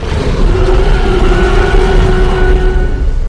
roar; rex

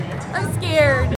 scared

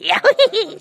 yahoohehe

